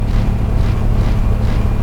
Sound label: mech fast, vehicles